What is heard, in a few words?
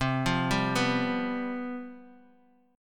C Major 7th Suspended 4th, down